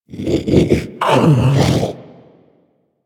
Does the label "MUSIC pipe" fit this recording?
No